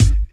90s studio hip hop kick drum single hit g key

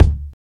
strong bottom end bass drum D key; kick

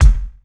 mid range bass drum sound f key; kick drum samples

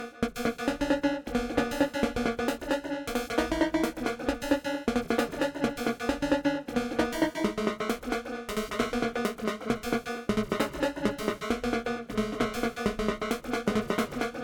techno chord lead groove d sharp m; instruments; loops

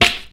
dry snare single hit f key; snare drum samples

